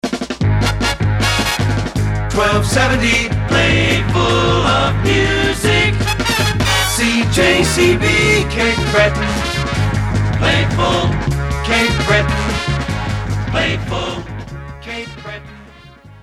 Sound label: Playful Of Music; CJCB; PAMS